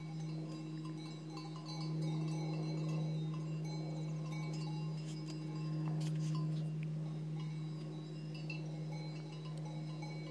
Swiss cowbells and bi plane

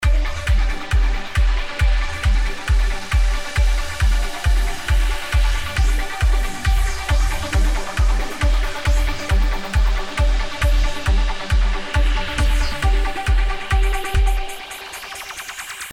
studio session 8 bars bridge

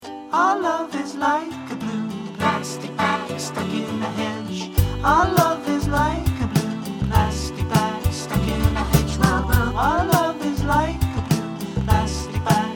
short song, blue plastic bag

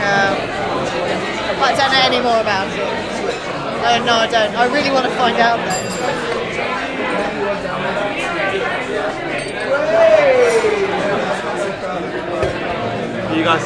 party

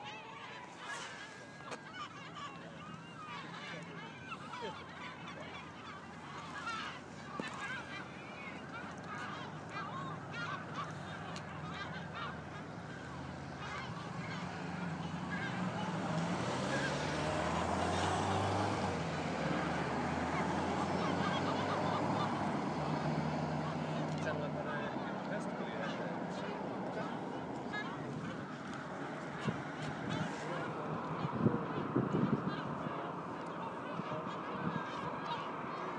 seagulls and traffic in Reykjavik harbour Iceland

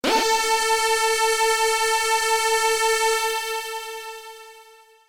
violin tuned to this moog

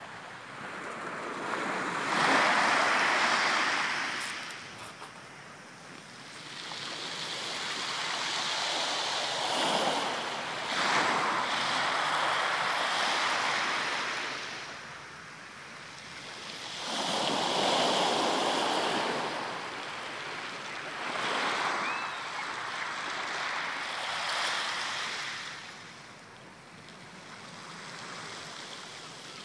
brighton beach

